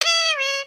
parrot chatter; creatures organic

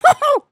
Chase

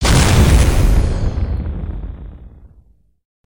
Spider Boss Fire Rain Impact; creatures mechanical

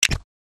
brick no connect bip, build mode

